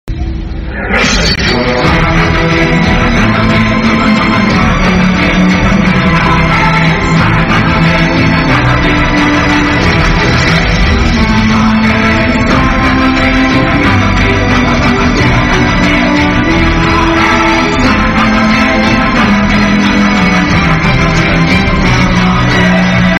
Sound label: climax bgm ringtone mass bgm; bajarangi 2